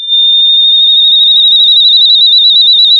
pop, sound of a tea kettle whistling

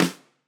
Flam, drum

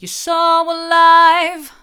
hard, vocal

